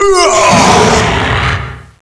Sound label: human death male